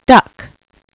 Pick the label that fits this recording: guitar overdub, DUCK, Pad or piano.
DUCK